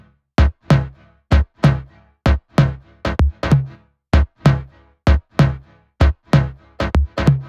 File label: Melody 2, 128BPM; Venus Melody 2